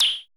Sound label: RES-SHOT, drums; Drums In Your FACE!